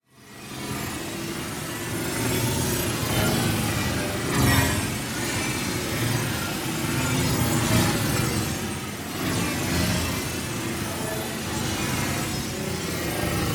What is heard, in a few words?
PARTICLES Portal Whirl; boom library; Matter